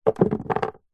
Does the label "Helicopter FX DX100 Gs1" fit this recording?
No